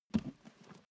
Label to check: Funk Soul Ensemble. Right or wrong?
Wrong